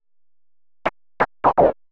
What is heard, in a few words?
HOUSE FX 125